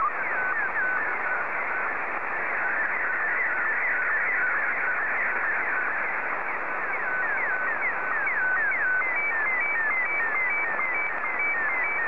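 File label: Martin 1, slow-scan television; JA3QJQ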